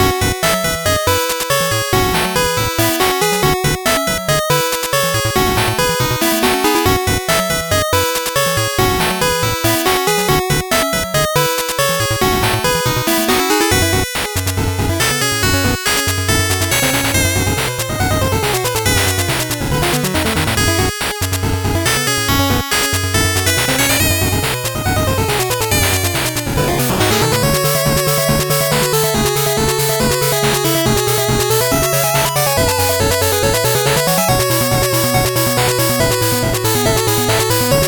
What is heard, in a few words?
8bit bgm slow